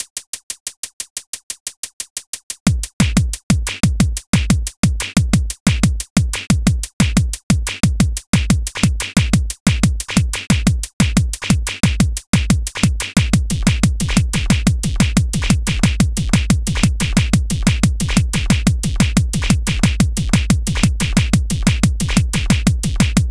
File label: drum